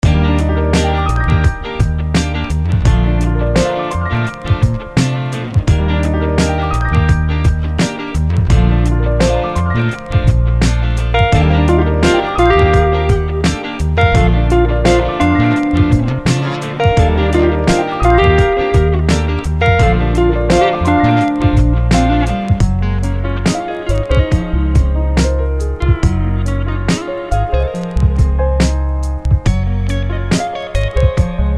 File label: Smooth Keys and Guitar, Sweet, chilled and laid-ba; Sweet, chilled and laid-back keys combined with crisp clean guitars and groovy bas; Smooth Keys and Guitar Vol 1; Vanilla Groove Studios